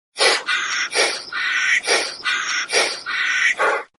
Chameleon Sound Notification

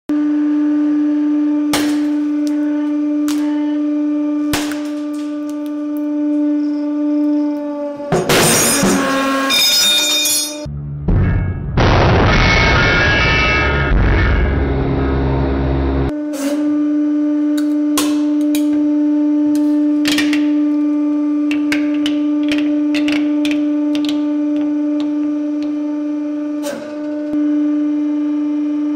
press machine

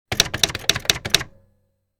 typewriter short

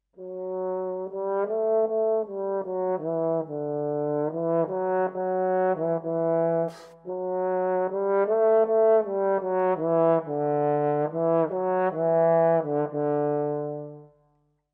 trombone

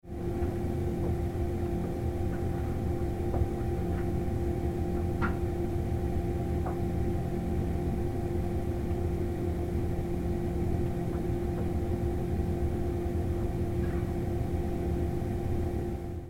Interior humming fridge tone

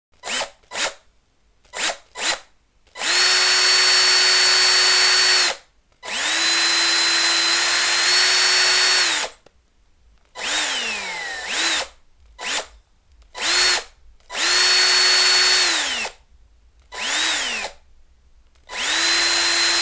screwdriver; پیچ گوشتی

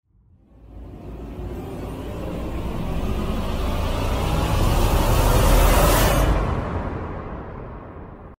Riser; هیجانی رایزر

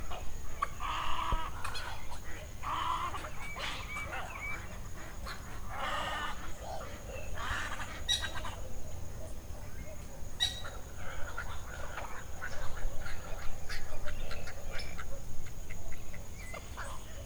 Snowy egret

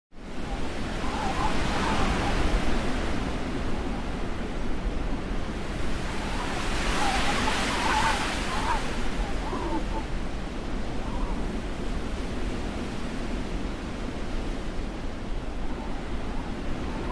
Snowstorm Sound Effect

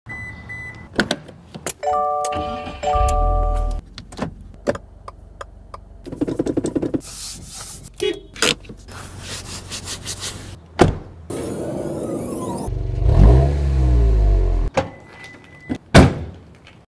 subaru

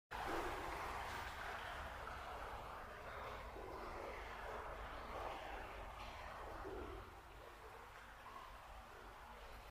Pool environment; محیط استخر

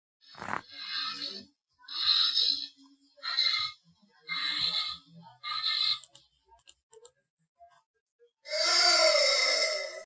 Pangolins; پانگولین

